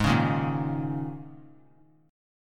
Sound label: Ab Suspended 2nd Suspended 4th Ab down; strummed; JGuitar